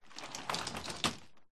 glitched tones household bedroom slide door plastic close shut